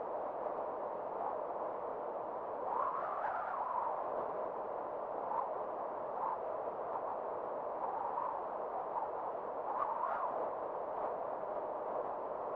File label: wind ambient; OpenValley